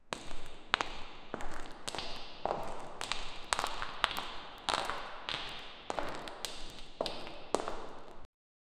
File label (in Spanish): 4 pasos sobre suelo; large room